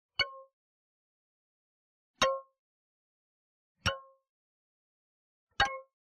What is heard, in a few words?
Digital Click Neutral; boom library; The Interface